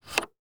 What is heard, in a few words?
Gear Foley Cassette Deck Various; FX; Cymatics; Eternity Sample Pack